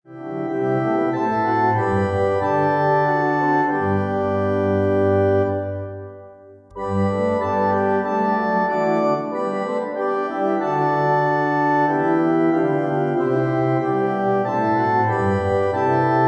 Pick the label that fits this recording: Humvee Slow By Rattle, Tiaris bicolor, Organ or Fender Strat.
Organ